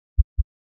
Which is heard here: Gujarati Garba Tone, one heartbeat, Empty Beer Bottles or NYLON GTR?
one heartbeat